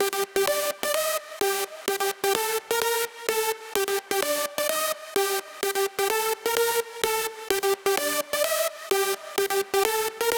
bpm progressive house; 128

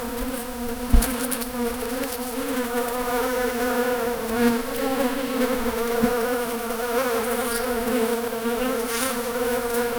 flies buzzing, insect

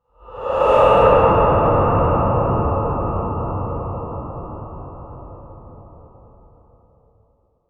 sound heavy breathing cal, breathing

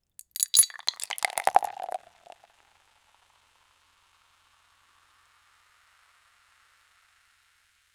opening a beer bottle and, beverages

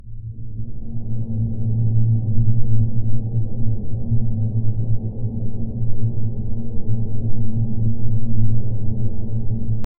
the bubble deep underwater

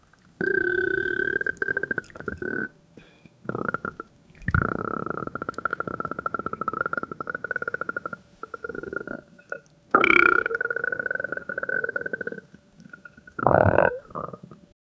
constant stomach ache gur

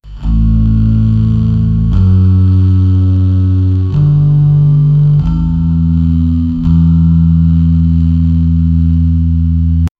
bass guitar playing fast

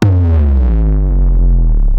impact

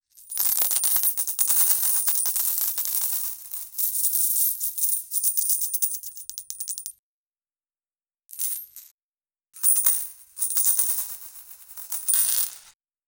gold coins being poured; money

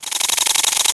planetary gearbox small; repeatable

